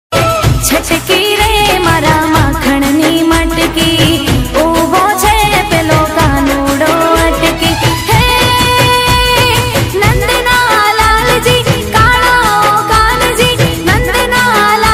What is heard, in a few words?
gujarati ringtone